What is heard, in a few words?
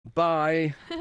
laugh